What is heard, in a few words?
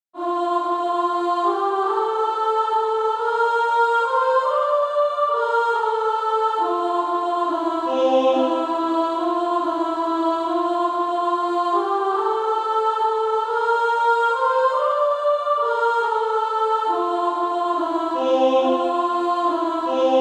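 Female Choir, alto 1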